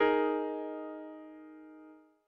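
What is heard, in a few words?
Eb sus chord; ackordtabell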